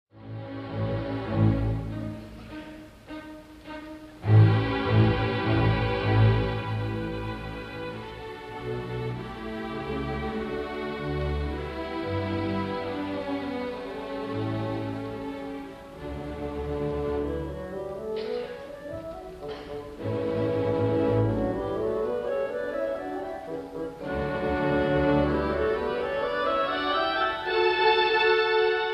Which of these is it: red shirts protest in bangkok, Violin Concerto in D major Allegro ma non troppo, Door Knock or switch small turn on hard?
Violin Concerto in D major Allegro ma non troppo